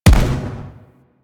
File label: Energy Rifle Split Explosion; Warframe; Venus; Venus Grenadier Spaceman Agent